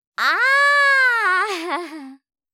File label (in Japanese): あ～(笑); 相づち・反応系【ネガティブ】